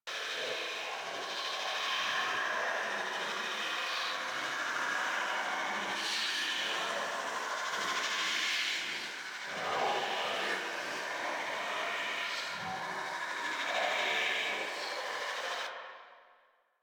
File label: Wraith Reaper Active Loop; Warframe